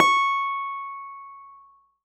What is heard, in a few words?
Keyboards C#5; Ableton Foundation FreePacks; Model-C BC